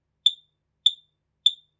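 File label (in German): Störung 3x; RA290Q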